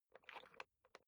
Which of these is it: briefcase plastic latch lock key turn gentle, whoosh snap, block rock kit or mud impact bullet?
briefcase plastic latch lock key turn gentle